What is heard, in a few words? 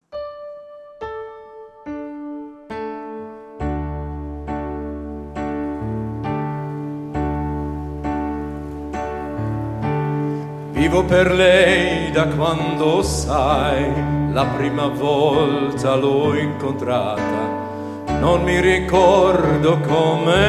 Solo